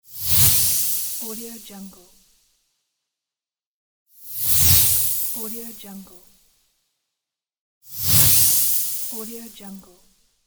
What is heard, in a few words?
magic flash; game sounds; فلش جادویی